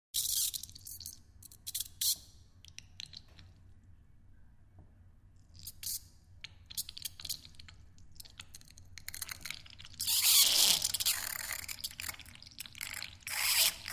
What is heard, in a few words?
Water drain in the sink